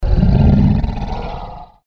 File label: low mean growl, scary sounds